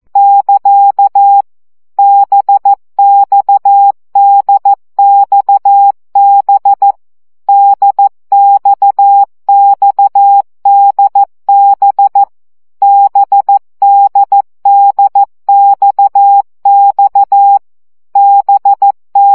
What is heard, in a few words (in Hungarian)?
CW gyakorlas d b x 12 baud